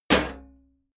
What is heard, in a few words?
sheet clank damped